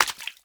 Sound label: splash, player steps; quake2